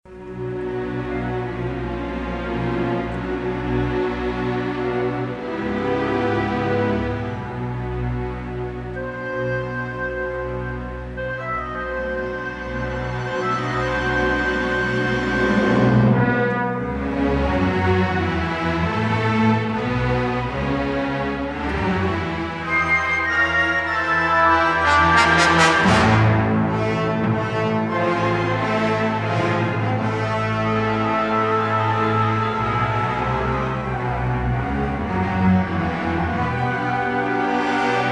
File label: Forest - Ambient; Forest Ambush